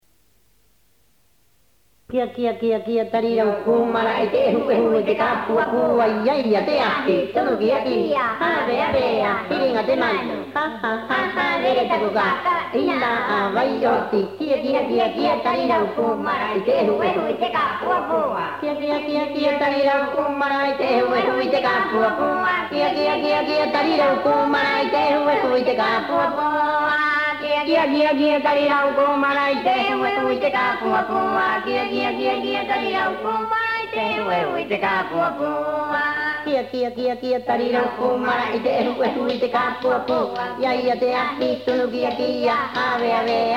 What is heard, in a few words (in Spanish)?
rapa nui kia kia, musica y danza